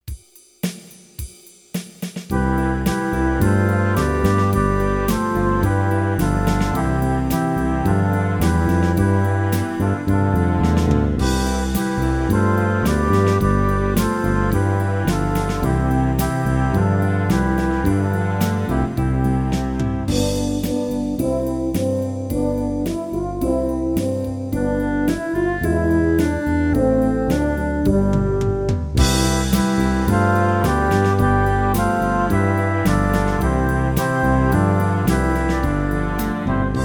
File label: Left Hand Accompaniment